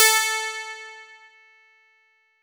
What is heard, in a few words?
A3, PIANO; AKAI S-Series CD-ROM Sound Library VOL-8